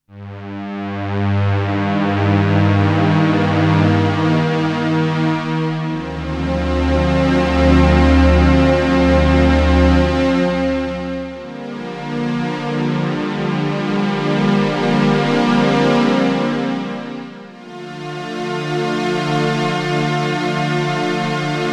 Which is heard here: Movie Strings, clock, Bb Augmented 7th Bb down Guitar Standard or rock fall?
Movie Strings